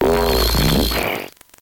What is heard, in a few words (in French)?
Cri